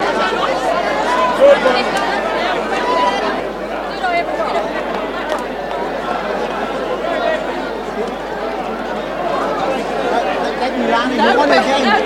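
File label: Race Track Crowd Australia, horserace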